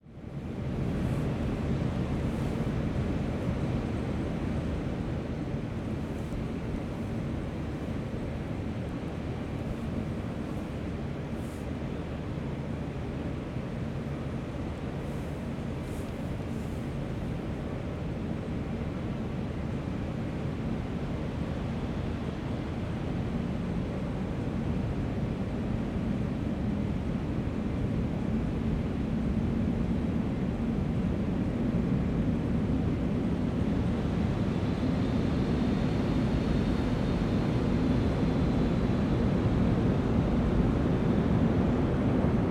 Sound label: Metro, Soundscape